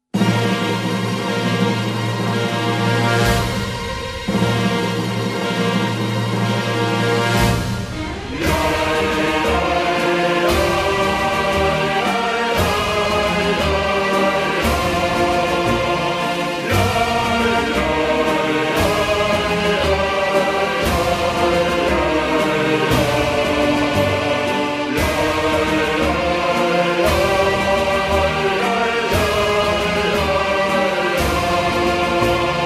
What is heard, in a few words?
Red Army Victory; frontline attack war over europe 2002